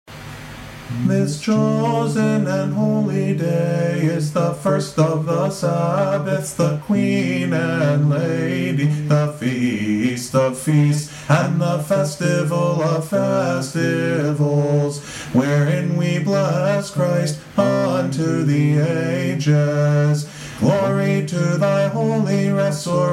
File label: paschal canon ode